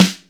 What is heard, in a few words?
close mute s, Jazz; SN-R8-05 Jazz